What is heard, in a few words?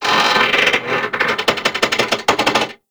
Asteroid Machine Creaks; Warframe; Grineer Asteroid